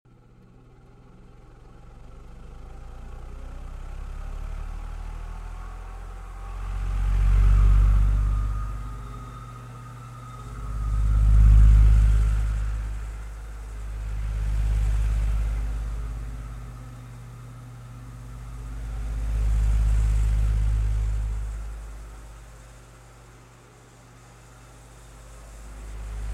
Electric Fan 2 Turn on w Oscillations & Off, Household